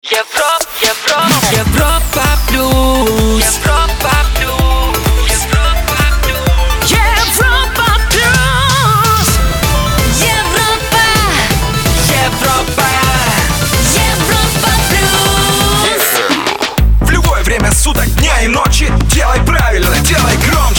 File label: Jingle Main ID; Europa Plus